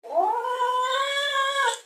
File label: 2 syllable wahhahhh sustained flat annoyed; sylvester the cat